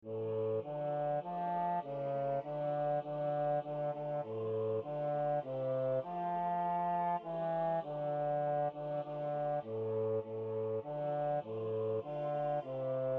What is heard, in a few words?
willow bass